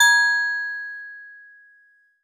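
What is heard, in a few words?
Steel Drums DX100 G#5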